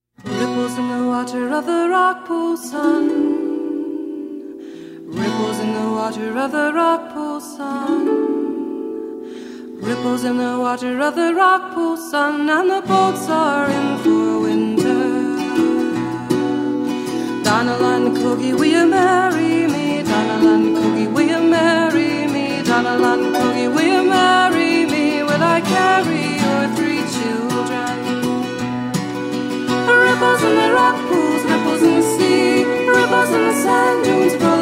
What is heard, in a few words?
Fiddle